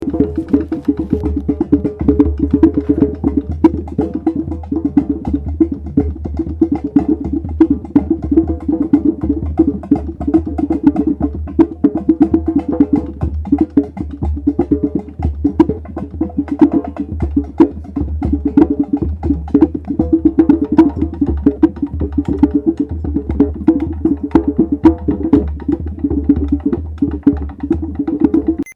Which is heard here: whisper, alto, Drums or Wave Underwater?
Drums